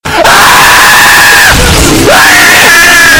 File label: loud scream